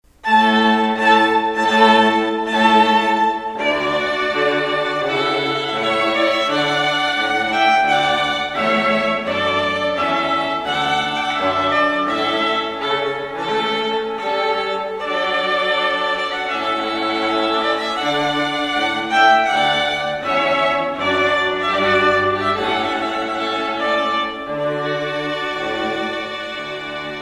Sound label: String Quartet; Trumpet Voluntary Clarke; classical string quartet; Montani Music Ensemble